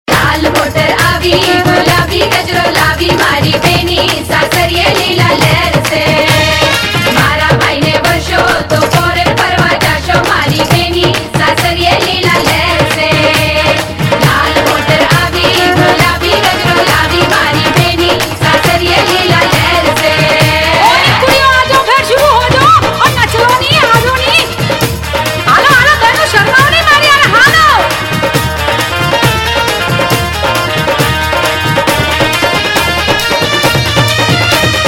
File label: Punjabi Gujarati Sagai Song Gujarati Ringtone; Bollywood; Patel Ki Punjabi Shaadi